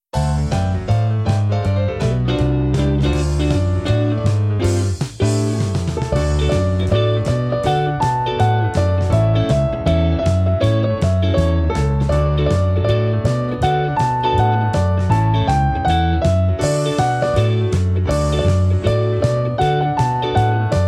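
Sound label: Accompaniment Only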